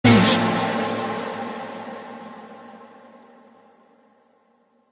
summer vocal ringtone

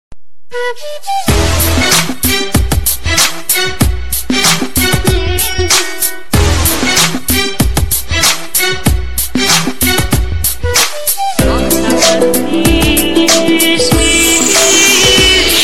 nice ringtone

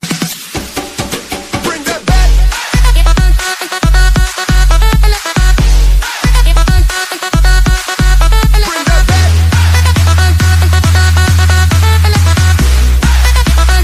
remix english ringtone

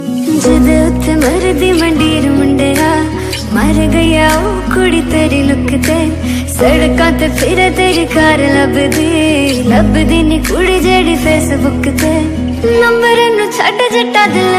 bollywood punjabi ringtone

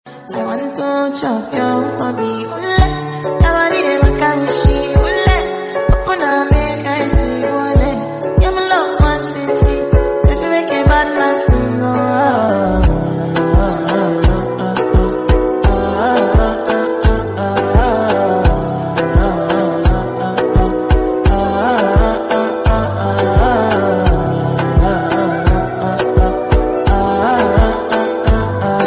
love theme background music tone ringtone; samsung ringtones

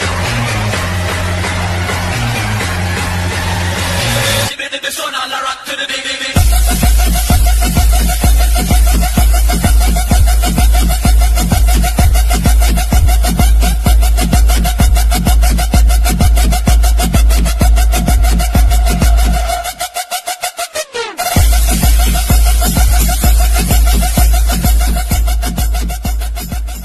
romantic english ringtone

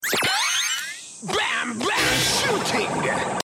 bang bang shooting ringtone

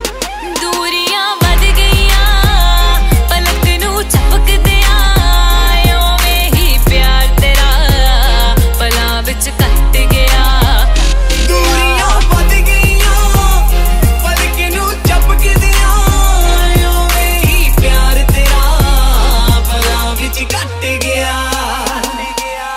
punjabi sad ringtone; samsung

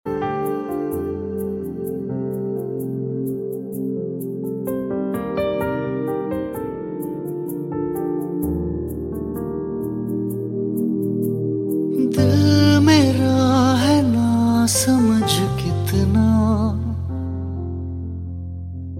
sad male ringtone; yaariyan

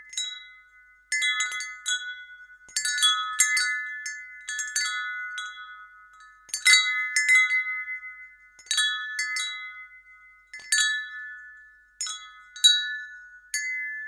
wind chime ringtone; samsung ringtones

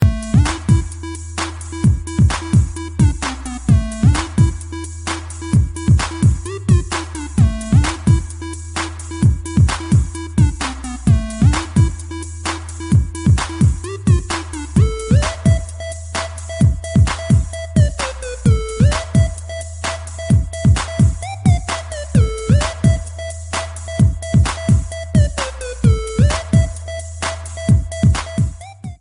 samsung play ringtone

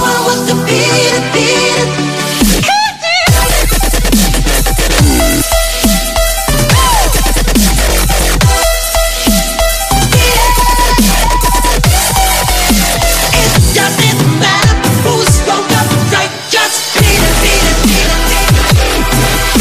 beat dubstep ringtone

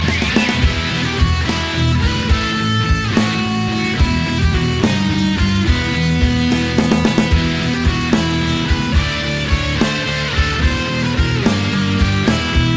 rock ringtone; rocky; nokia ringtones

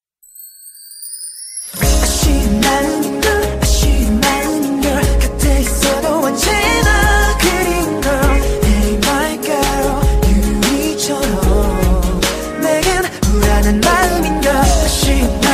english song ringtone

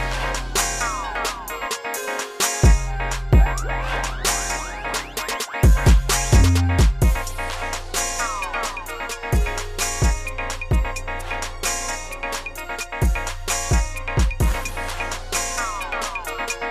nokia ringtone; phonenex

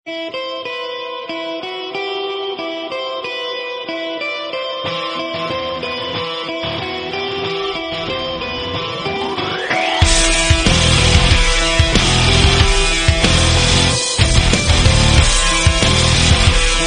most famous incoming call tone ringtone; nokia ringtones

